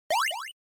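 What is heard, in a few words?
Game Boy General Pitch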